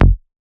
Raw Bass G

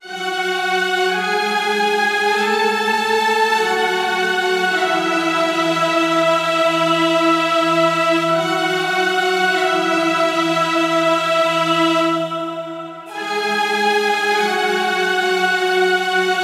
Strings Melody - F#m 100BPM; STEMS; Ghosthack; SkyRealm; Mood Kits; Mood Kit 04 - Travellers Heart

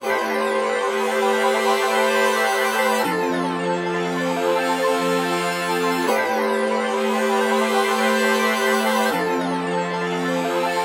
158 BPM A Min Chords; Cymatics; 2022 Melody Collection; Wavy; Stamina

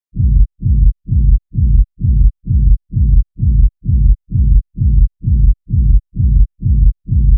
Bass Loop 130 A, Techno; Ghosthack; Free Techno Sounds 2024

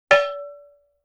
Cowbell Mid, Vintage Cartoon; Drums & Percussion

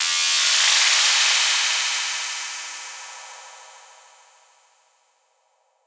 Techno FX 135; AC2024 - Day 1 - Techno Sounds 2025

